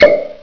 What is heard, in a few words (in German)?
KORKEN